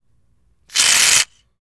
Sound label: shaving cream